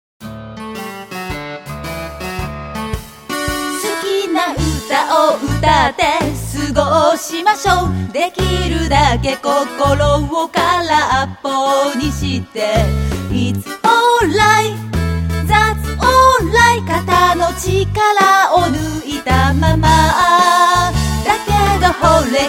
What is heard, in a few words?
vocal